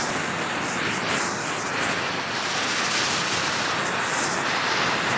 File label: smoke loop; firearms